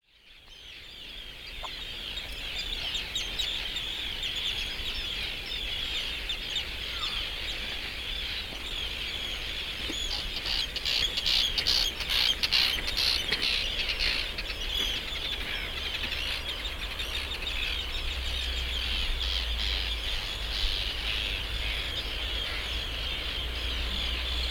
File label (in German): arnarstapi voegel shore